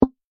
bug Decrease

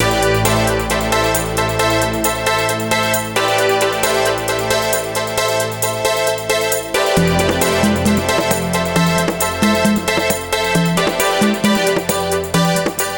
Steel and Thunder, Stage; gunlord dreamcast; Holy Mountain I

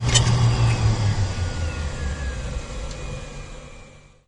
fan shutdown

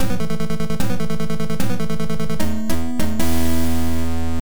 Bonus Game Start

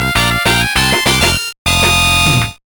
Bonus Win; Donkey Kong Country 2 Diddy's Kong Quest; GBA

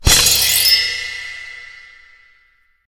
Egg Break; Reward Egg Break Lvl-2; angry birds

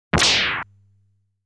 Fire Breath

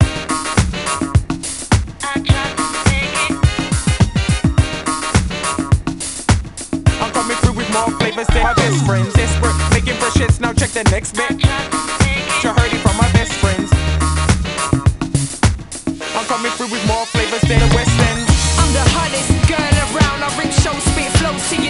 Vocals

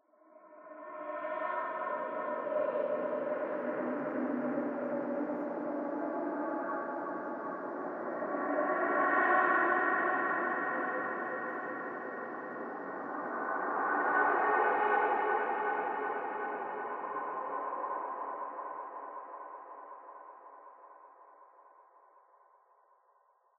Interior Dwb Ghosts

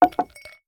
Glass Down with Ice; the 39 steps

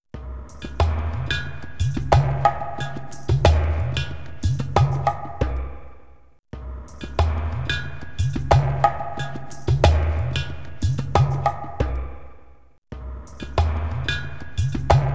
Drumming

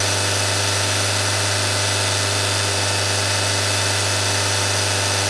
Engine Kart Rpm Med; multiplayer kart racing; boom karts; android

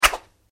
attack body; Monster Arena

